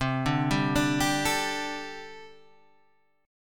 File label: C 6th Suspended 2nd C